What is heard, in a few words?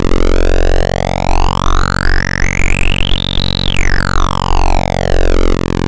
Apple II FX Acid Scanner, Chiptune